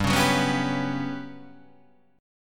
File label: G Major 7th Flat 5th